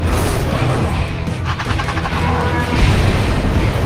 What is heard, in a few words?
laugh; ghost rider